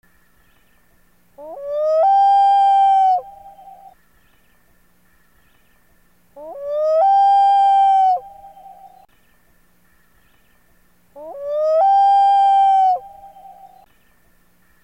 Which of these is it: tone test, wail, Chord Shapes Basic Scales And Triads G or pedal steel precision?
wail